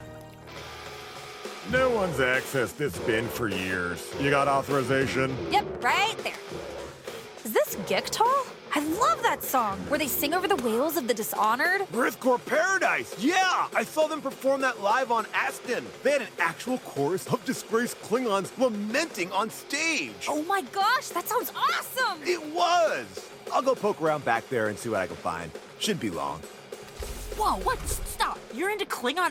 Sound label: klingon acid punk; klingon database